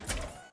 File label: exo step; STALKER; IWP